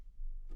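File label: Contrabass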